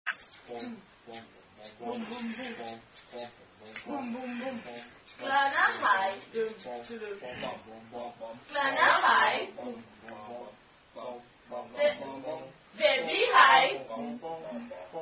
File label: German Attack Song